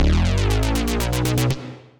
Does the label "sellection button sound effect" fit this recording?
No